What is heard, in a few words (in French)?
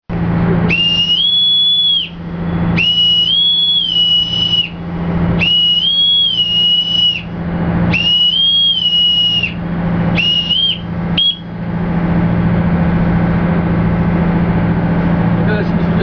sifflet; chants